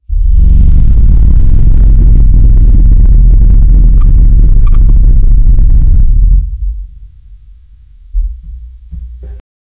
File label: low rumble begins; atmosphere